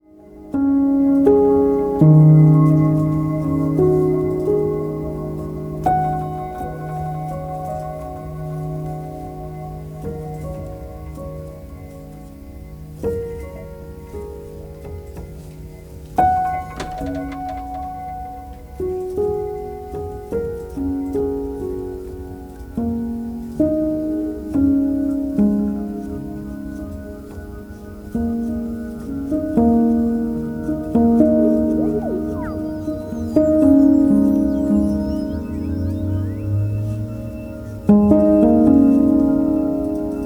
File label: Piano Movement; Electronix